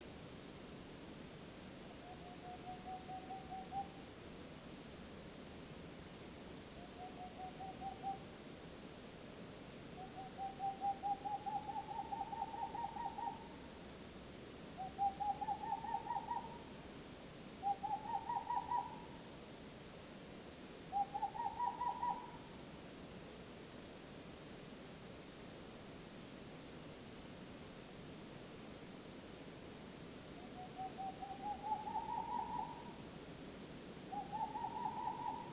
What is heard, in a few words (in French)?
Chouette de Tengmalm; Aspe